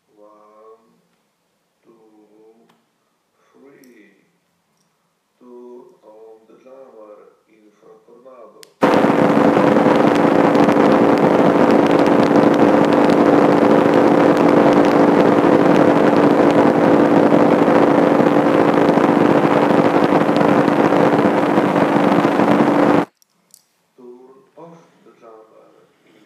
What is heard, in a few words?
real test 3 meters; INFRATORNADO; Iphone 6 Plus